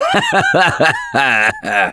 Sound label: Laughter, Survivor Voice; Gambler; russian